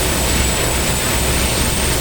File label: Teleport Beam Loop; SFX Kit; Sci-Fi Loops